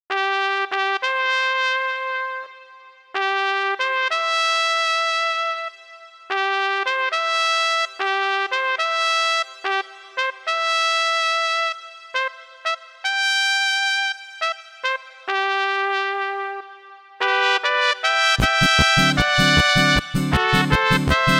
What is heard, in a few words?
120 bpm Bass Ovation